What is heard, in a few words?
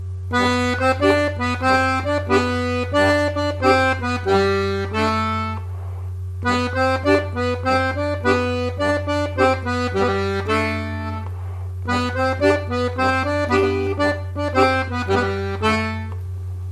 swing